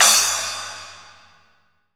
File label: CYMBAL CRAS